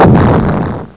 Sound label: bomb